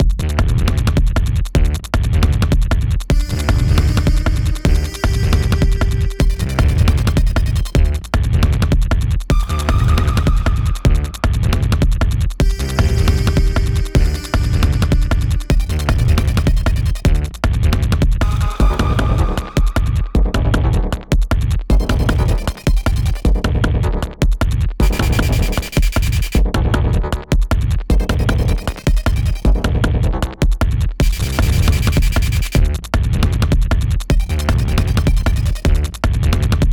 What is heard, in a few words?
Drum n Bass